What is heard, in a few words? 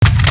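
double barrel reload end, weapons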